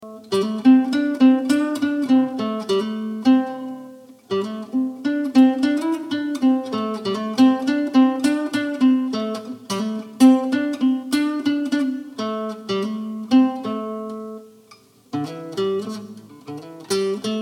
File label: Sweet 60s Guitar Practice